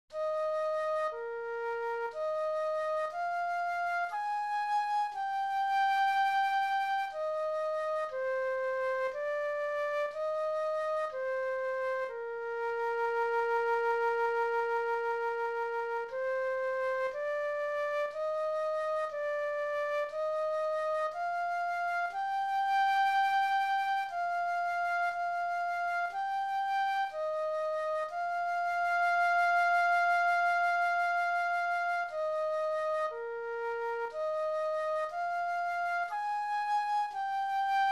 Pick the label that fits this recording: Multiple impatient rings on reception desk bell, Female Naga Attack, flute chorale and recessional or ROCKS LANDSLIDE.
flute chorale and recessional